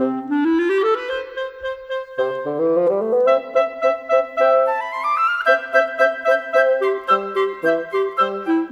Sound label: Cinematic Winds; Sonic Foundry (Sony Creative Software); Orchestral Series VOL-3